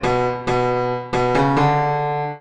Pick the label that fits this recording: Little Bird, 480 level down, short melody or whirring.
short melody